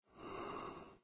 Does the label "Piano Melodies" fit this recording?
No